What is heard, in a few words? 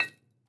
Drop A Brick